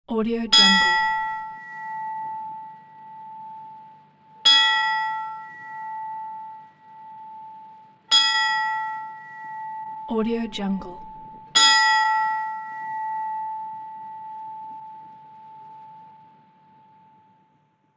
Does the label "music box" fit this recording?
No